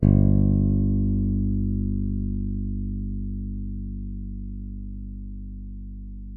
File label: YAM FING G 1, Bass; Masterbits CLIMAX 7; PATITUCCI BS